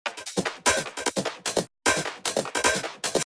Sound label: hat Micro Shift